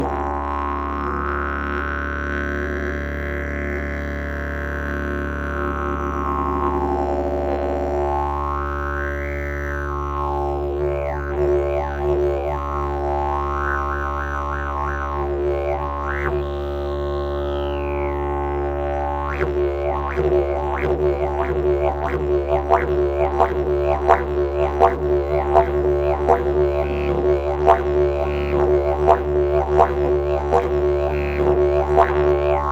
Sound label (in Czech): javor cernobil, didgeridoo